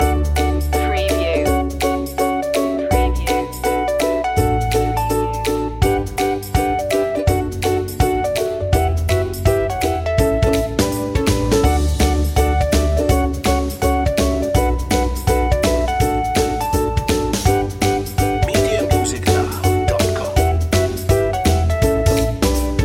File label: happy explainer loop